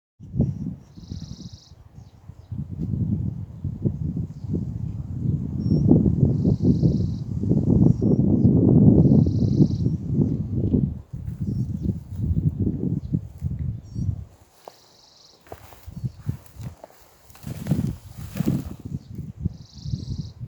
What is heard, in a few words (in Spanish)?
Cachudito pico negro, Cachudito Pico Negro (Anairetes parulus)